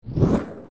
Fire Ignite

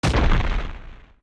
Rock Shatter; zelda; OOT

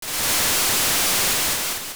Sea Wave; Waves at sea

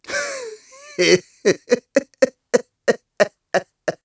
Laugh Escape; Ghirahim laughing as he escapes through the Gate of Time; Skyward Sword

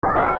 Drop; Iron Knuckle; zelda; OOT

